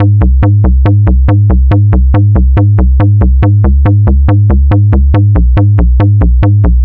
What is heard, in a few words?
NRG Bass, Trance